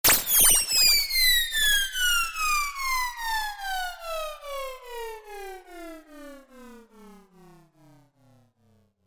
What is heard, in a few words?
sound design frequency modulation synth burst and fall